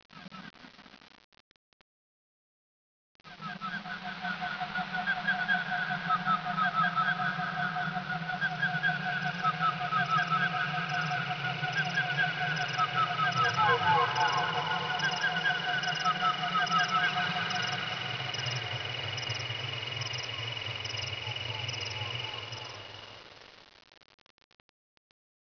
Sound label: RAIN BUB